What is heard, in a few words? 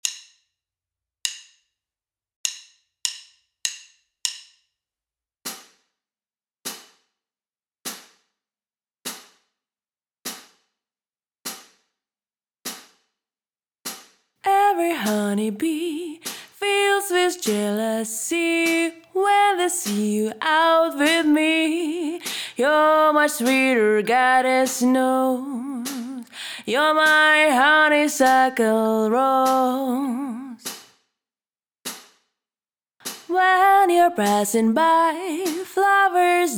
c nopiano